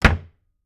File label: Household Wardrobe Door Close